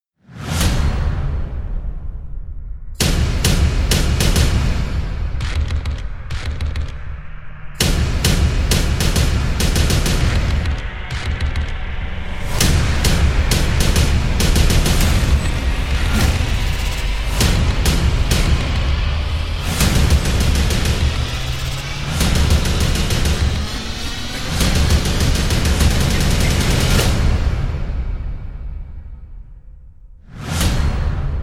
trailer backends time bomb perc

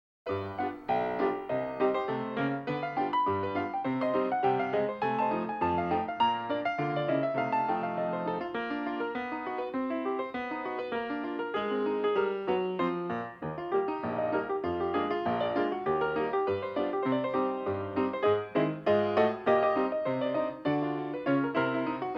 boat piano

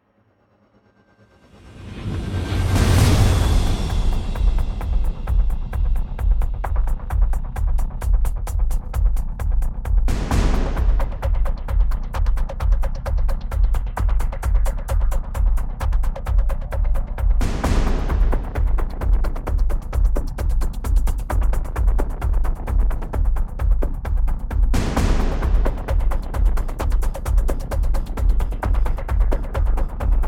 percussion only

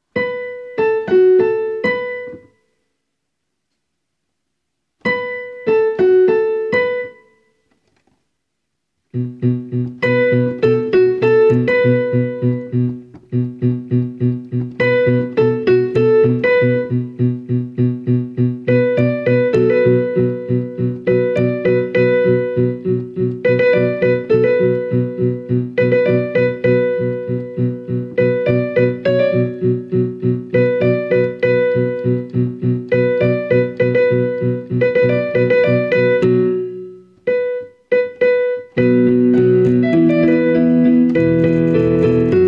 piano instrumental version

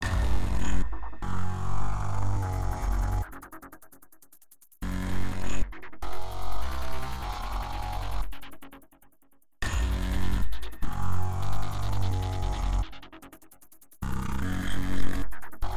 synth

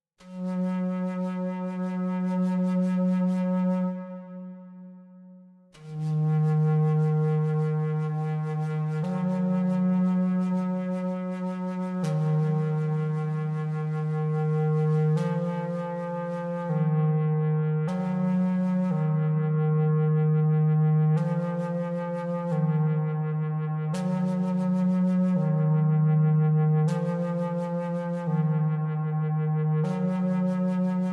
native american flute

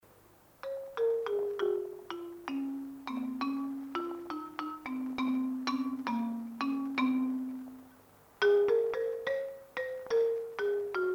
sound effects xylophones

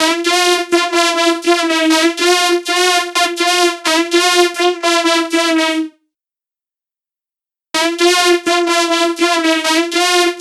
vocals

